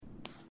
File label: pick up paper sound; Life is Strange 2; gamesoundopedia